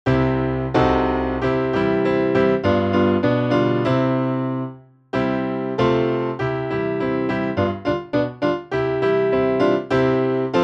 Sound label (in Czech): Holka modrooka C dur